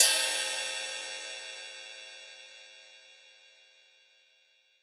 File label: Riddim Ride; One Shots; Hats & Cymbals; Ghosthack; Riddim Essentials 2020